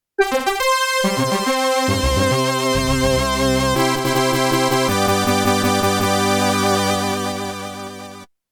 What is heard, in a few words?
Harvest Ceremony Fanfare; FM-TOWNS